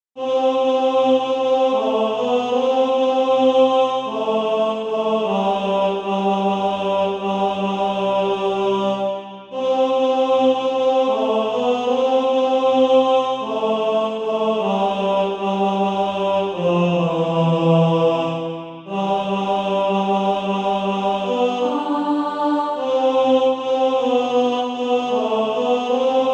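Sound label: tenor; Far Out on the Desolate Billow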